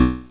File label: Drums, Hits; DCM8